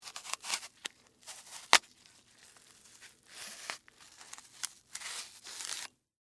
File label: Apple Cut Knife, Household, Apple, Cut, With, Knife; Food